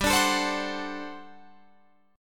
G Major 7th Suspended 2nd Suspended 4th G down Guitar Drop D